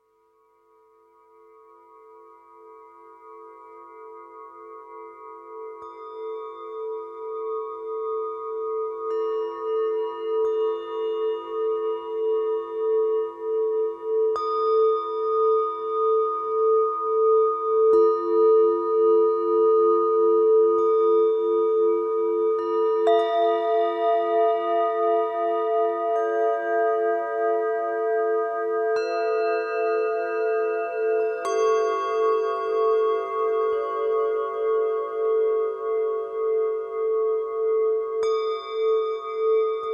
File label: Improvisation for the sixth chakra, Singing Bowls